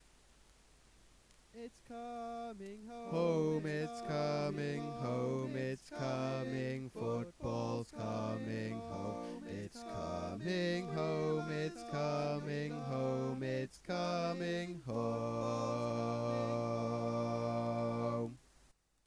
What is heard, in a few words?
Bass, barbershop tag